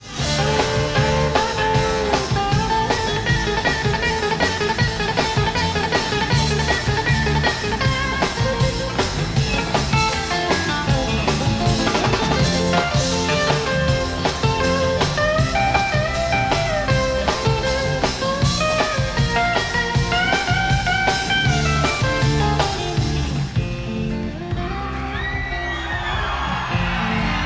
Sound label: Pensa